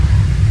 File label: rock fly